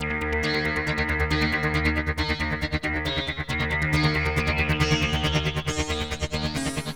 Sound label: SYNTH SEQ 137; AMG; Global Trance Mission VOL-2